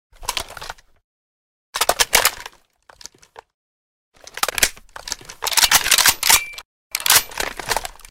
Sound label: ak47 inspect empty; emx draftsman